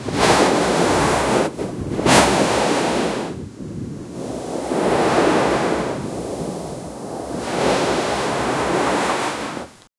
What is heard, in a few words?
beach blanket blowing in